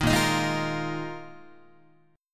A sharp 7th Suspended 4th, Guitar; strummed; JGuitar

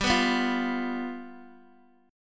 G 7th G down Guitar Open D

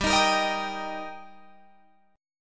G Minor Sharp 5th G Guitar Open F, chord; strummed; JGuitar

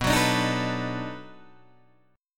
B 7th Sharp 9th, down, Guitar, Open D

